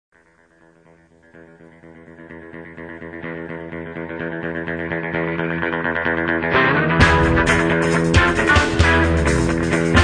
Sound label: guitar